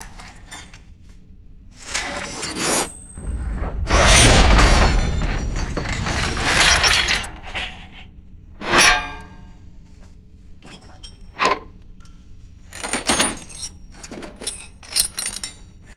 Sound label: heavy metal debris scraping and crashing